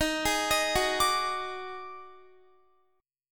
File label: Eb Minor Major 7th Double Flat 5th, Guitar; arpeggiated; x,18,18,20,18,21; 4E,4A,5D,5G,5C,6F